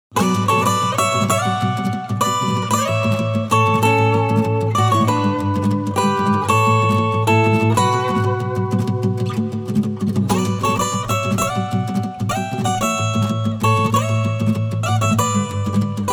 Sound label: acoustic; Flam Stag